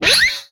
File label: Sci Fi Gun, Blast; Space Blasters2D